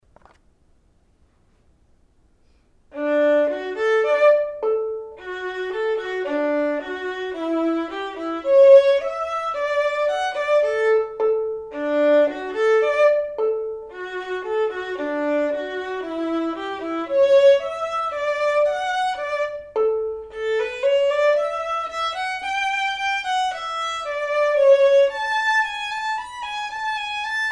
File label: perf vln